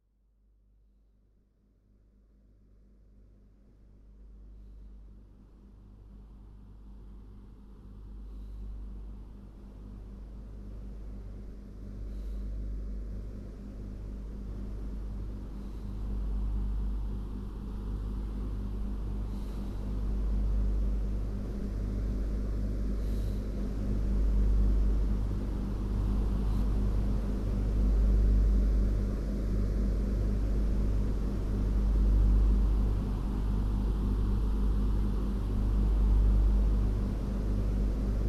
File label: white noise, Meditation binaural; Aham Brahmasmi; Binaural Hybrids